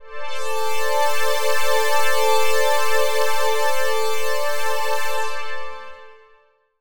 ELECTRO PAD 140 A; Keys

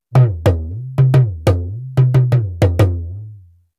ETHNO EXTRA 90; ethno2

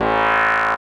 SNTH BASS ELCTR 140 A, Techno

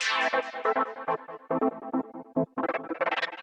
TECHNO 140; Techno2

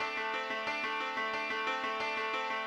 ethno, 90, D

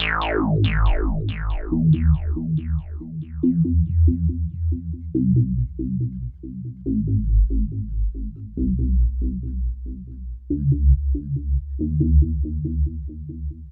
synth tekno 140 A; Techno2; Keys

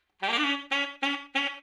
OLDSCHOOL BRASS 96

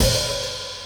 Open Hat; Touch Loops; ClassicBreakSessions

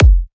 kick, drum hits; Electro; Anthemic

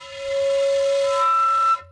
bottle, ethno, 125

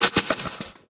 Hat

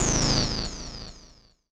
KEY SHOT TEKNO 140 A; Techno2; Singleshots

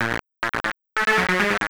TECHNO SYNTH 140; Keys

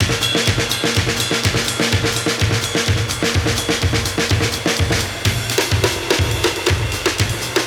125 Classic Breaks, 125

